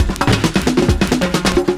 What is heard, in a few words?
PERCS, 70 s Breakbeats